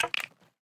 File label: symbol Stop On Stone; magic maid cafe